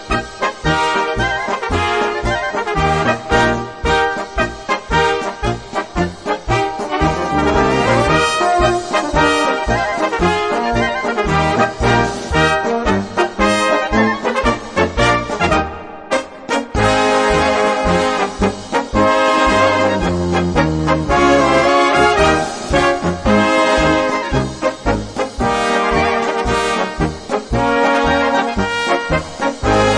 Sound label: Polka; Hubertus Polka